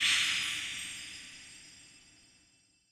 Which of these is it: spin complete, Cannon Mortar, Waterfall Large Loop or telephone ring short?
spin complete